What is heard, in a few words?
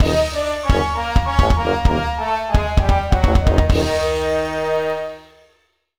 Boss Lose; mario vs. donkey kong mini land mayhem; Final Ferris Wheel